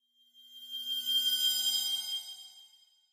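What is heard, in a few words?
Crystal Ring High Pitch, chimes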